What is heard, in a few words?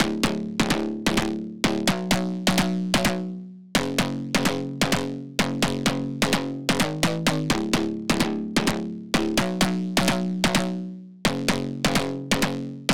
128 BPM F# Min Bass; House; Drop Loops; Cymatics; Mothership